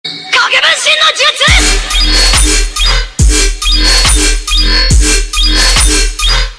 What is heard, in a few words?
Dubstep; Naruto